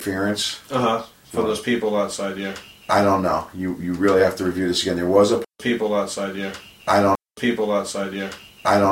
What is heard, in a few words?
scream